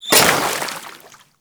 Kunai Impact Water; Warframe; Hit Effects; weapons

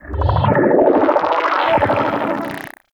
Switch Transition; Warframe; Anima Animus

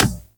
Beach Ball Kick; Warframe; Water Fight; Beach Ball Floof

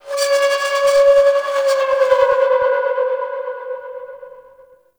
Metal Groans And Scrapes, Asteroid; Warframe; Grineer

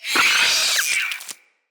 Root Spider Elite Vocal Death; Animals; Spiders; Vocals

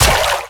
Step Water, Infested; Zealoid Prelate

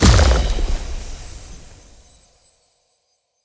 Stomp Impact; Powers; Fey; Champion

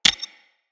Console Hack Button Press

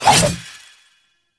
Two Handed Nikana Spin Swing; Warframe; Duviri; Dax; Weapons

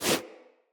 Wall Jump Foley; Footsteps; Wall Run; Characters; Tenno

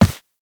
Operator Run Default, Footsteps; Warframe

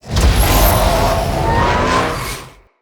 Canon Charge; Cinematics; Rail Jack; Rail Jack Archwing Canon

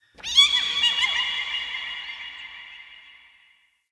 Root Cave Small Critter Squeaks; Ambience; Neathuns Root Cave

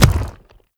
Arm Pat; Enemies; Warframe; ManInTheWall

